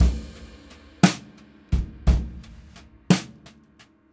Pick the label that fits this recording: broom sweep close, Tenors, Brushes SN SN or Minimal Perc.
Brushes SN SN